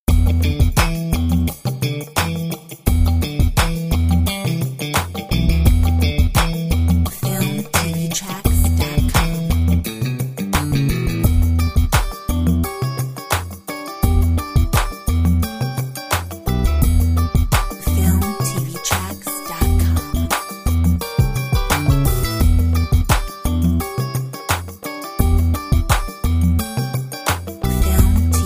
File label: True funk